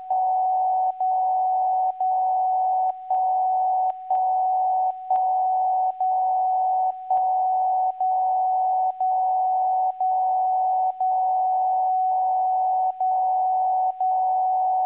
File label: DCF77 mod CW 400Hz 77.5kHz; VLF